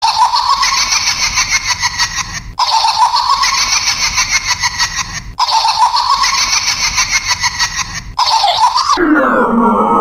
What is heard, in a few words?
Laugh